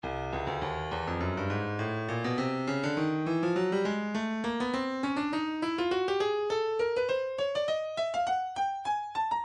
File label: breakbeat 102 bpm; cruz c; Stylus Breakbeat Midi Files